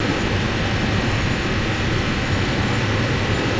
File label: engine; Star Wars Knights Of The Old Republic I; XBOX